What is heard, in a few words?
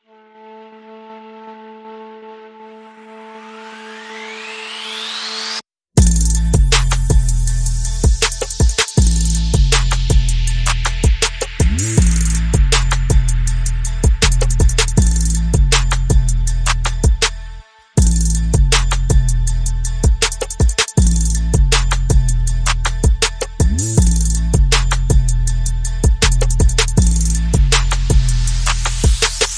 No Synth Lead